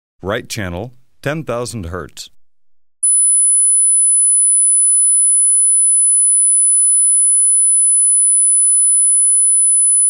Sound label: Right a sinus of 10 Hz, -10 dB